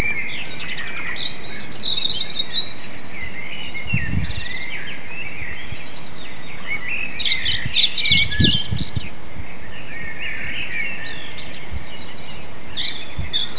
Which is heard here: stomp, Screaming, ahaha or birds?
birds